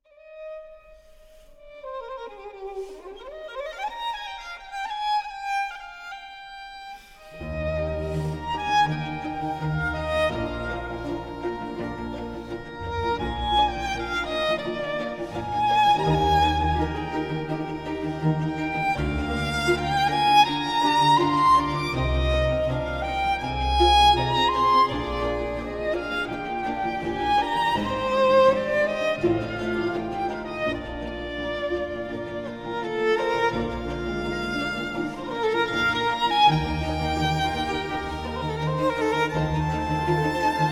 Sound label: Violin Concerto In F Minor; Vivaldi; The Four Seasons (Winter); Clasica